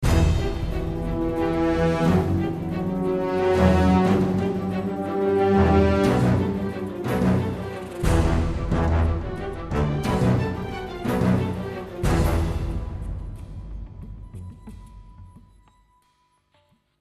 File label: Action